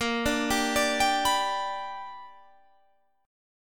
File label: G Major Bb, chord; G/Bb arpeggiated; JGuitar